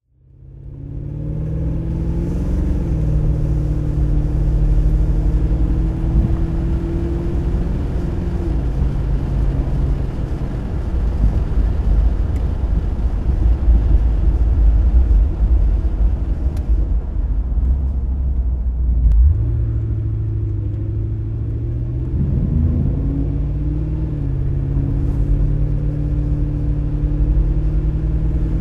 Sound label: aston martin V8 volante driving